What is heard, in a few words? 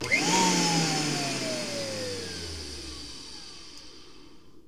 BLENDER OF, Kitchen Counter; SFX; E-MU Producer Series Vol. 2; More Studio Essentials